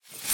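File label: Cut-Metal Reverse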